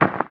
Ground short; Devour 2021 gamerip pc macos

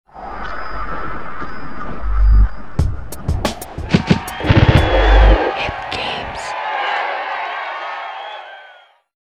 Logo

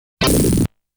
explosion sound; genshitou prehistoric isle

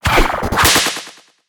whip

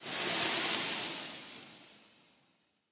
Onsen Water Change Clean; The Inn; Devour 2021 gamerip pc macos

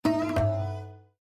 Raga Error; Windows 7

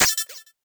Phaserang Return

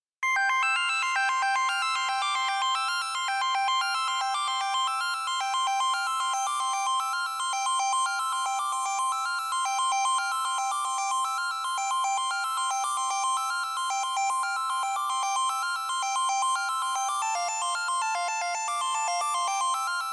Underwater; Super Mario Bros. 3

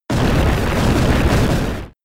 Earthquake

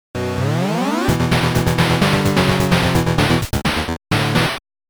Unknown Battle Jingle; Megami Tensei II; NES

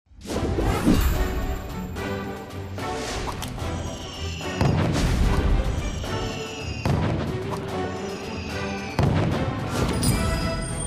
Bomb; Disney Infinity

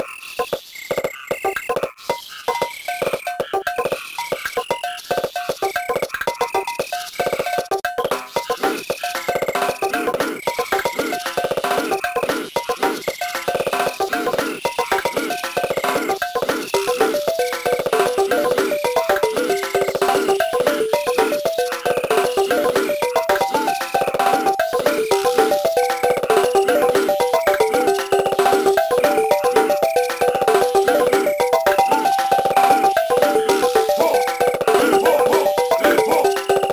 Tribal Drums; WarioWare D.I.Y.; Jimmy T. Record 17 - Overseas

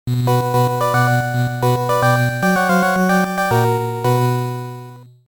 Bgm G Over; Castlevania The Dracula X Chronicles; PSP

